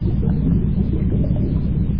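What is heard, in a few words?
underground water noise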